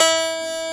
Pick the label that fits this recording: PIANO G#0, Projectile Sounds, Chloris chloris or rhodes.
PIANO G#0